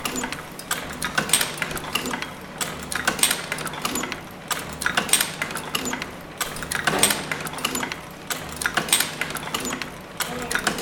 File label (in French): sons machine